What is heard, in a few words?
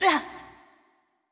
Hurt; Jackie; Going Under